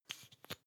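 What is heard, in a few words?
toothpaste open bright, Bathroom; haus-garten; badezimmer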